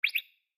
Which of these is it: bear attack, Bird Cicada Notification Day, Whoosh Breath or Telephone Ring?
Bird Cicada Notification Day